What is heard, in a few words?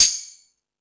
FX Sounds, Drum Machines